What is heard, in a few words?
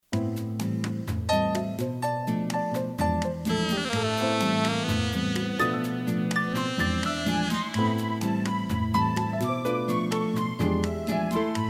Party Band